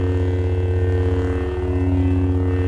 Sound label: saber hum; weapons